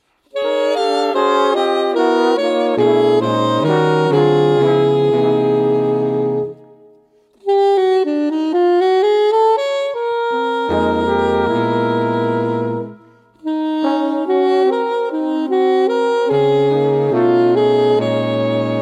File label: waltz